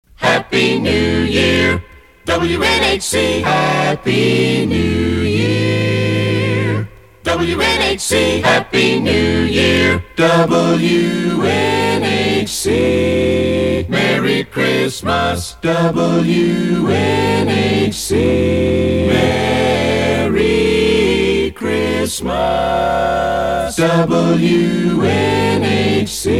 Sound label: Acappellas Sampler; WNHC; PAMS